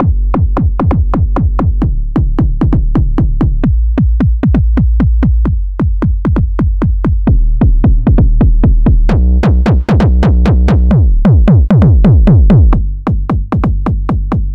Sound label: kick